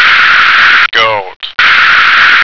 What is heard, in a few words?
goat